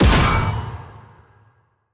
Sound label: Enemy Boss Generic Attack Hit; bloom minigame